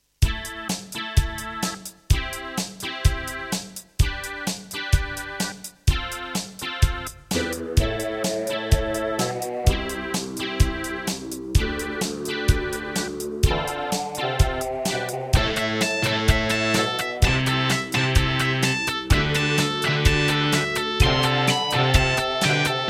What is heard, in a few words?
Akai SG01k; raptor call of the shadows